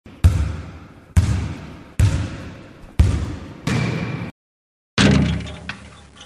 basketball backboard

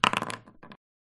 shake and roll dice

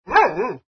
ANIMAL DOG

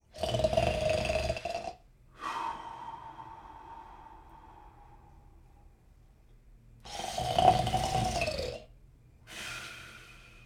Man Snoring

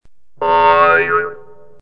Boing

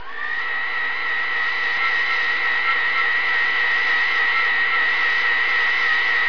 household

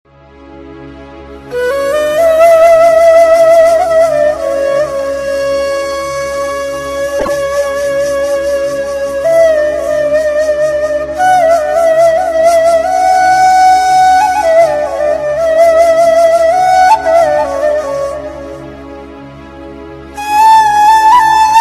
sad tone sadness

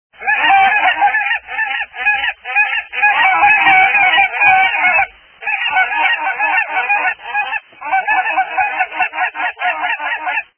geese

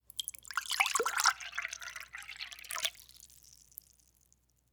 Pouring Water

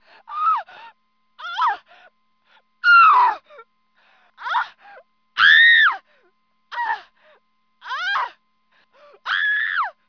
people

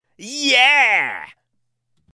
yeaa

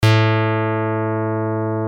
online guitar tuner a2

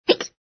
HUMAN HICCUP

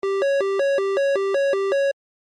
atm cash machine

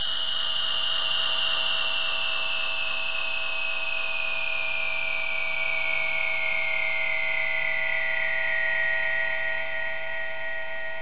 scifi

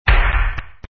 bang

